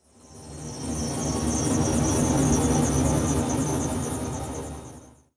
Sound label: hover; Alpha Centauri